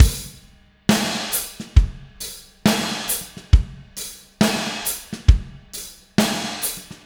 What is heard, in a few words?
Drums Crash, Indie Rock Anthems; Sonic Foundry (Sony Creative Software); Session 04 - Dubwyzz